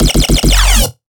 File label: Riddim Bass Hit F#; One-Shots; Bass Hits; Swarm; Ghosthack; Riddim Essentials Volume 2